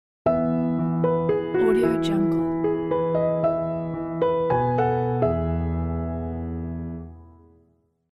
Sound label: elegant piano logo